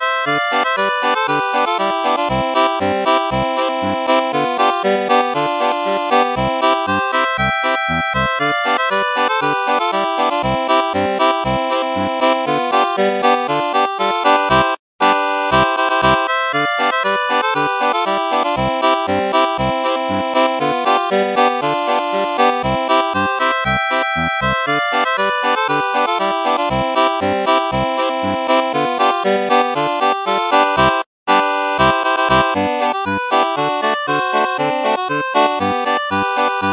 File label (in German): Polka 2 Harmonikas 1. Stimme